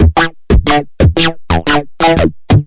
180 hard-punchy-synthmelody, synth and electronic loops